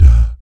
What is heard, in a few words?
PERC, Vocal, Jazz FX, BASS PERC